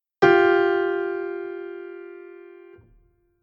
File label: major second